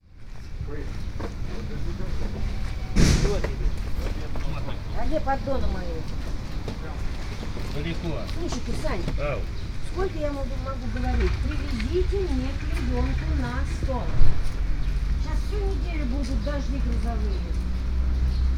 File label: street market; rabotchka; sergiyevposad